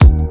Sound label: clear kick single hit d sharp key; kick drum samples